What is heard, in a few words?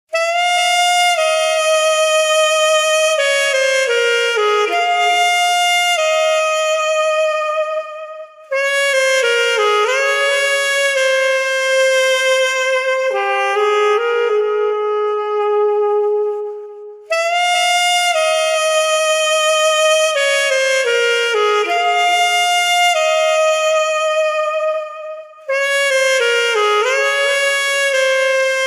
Saxophone Sound Ringtone